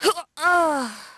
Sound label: death; Pulse Racer